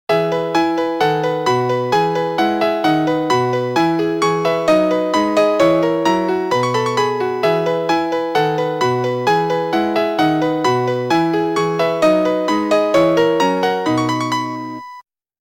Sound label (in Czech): melodie